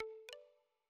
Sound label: pop